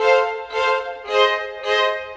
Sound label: Violins; Sonic Foundry (Sony Creative Software); Orchestral Series VOL-4; Rock and Pop; Rock-Pop 13